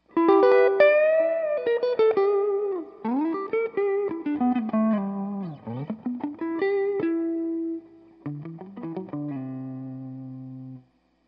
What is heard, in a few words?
walnut neck pickup